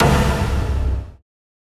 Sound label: Shot Device; FREE SFX PACK VOL 2